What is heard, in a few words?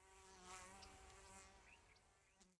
insect, MidDay; FontainebleauDemo